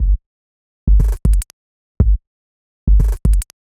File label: Kicks, Modular Electronica; Sonic Foundry (Sony Creative Software); TSS Autopilot